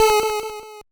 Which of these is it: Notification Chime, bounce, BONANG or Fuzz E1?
bounce